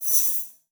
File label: Multimedia Internet CD-Rom Flash Wipe Short